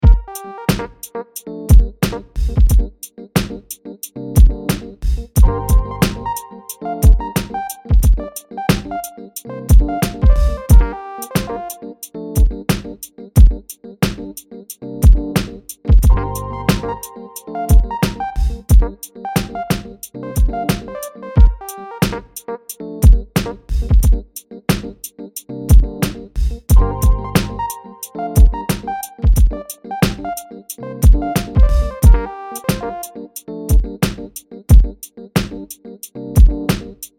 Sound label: 4 bar loop 90